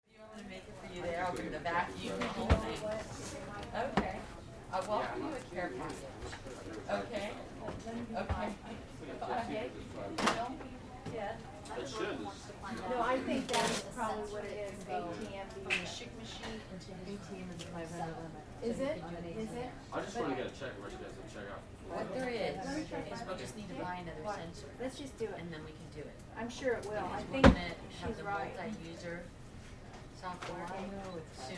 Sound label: Dentist Office: Reception Area Walla, With Phones And Paper Movement.